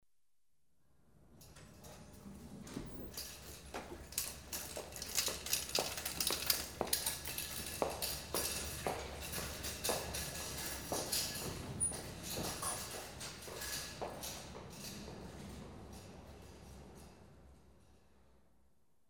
Trolley passes in corridor, Hospitals; BBC Sound Effects Library

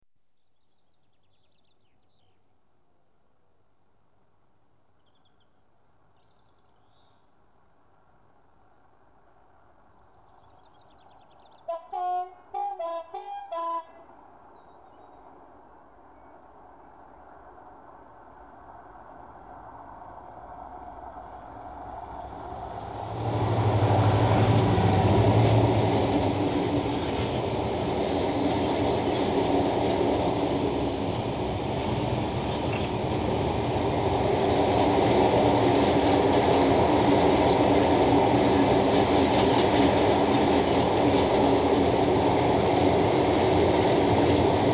Trains Passing Diesel heavy go; The BBC Sound Effects Library

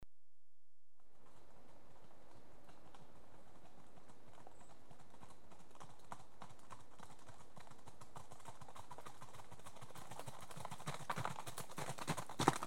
two horses at trot on gravelly track, l to r; BBC Sound Effects Library

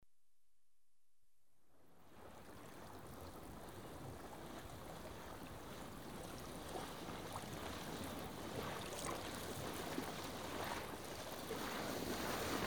sail past right to left, Ships & Boats; The BBC Sound Effects Library

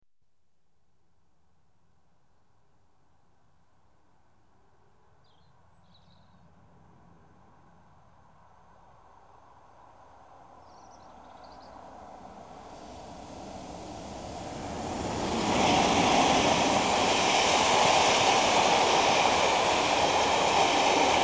Trains Passing Diesel express; The BBC Sound Effects Library

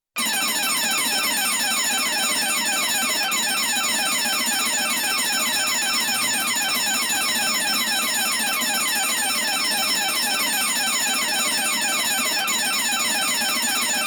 Burglar Alarm, Combined Bell & El; Emergency; BBC Sound Effects Library

